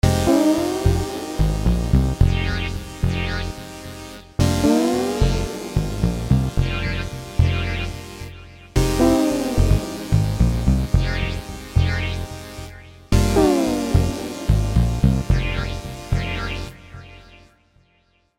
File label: ghost pitch; Synthix